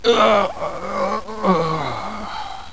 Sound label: alpha die; svencoop; paranoia